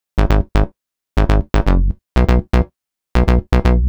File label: nu skool jam Ab 121, synth loop; Zero-G; Soundclash; Nu Dance Electro